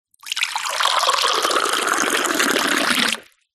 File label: food drink boiling water pour from kettle into cup fast speed; zapsplat